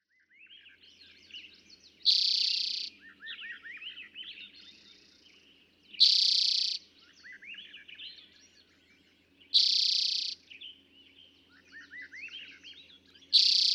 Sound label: Eastern Towhee